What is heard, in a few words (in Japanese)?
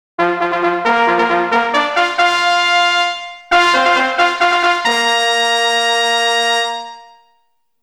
ちょっとヤル気のファンファーレ; Monster Farm 1 2